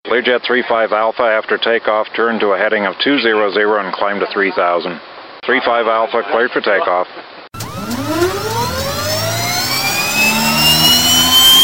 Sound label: flight take off